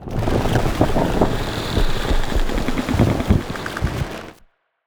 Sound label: ABYSSM idle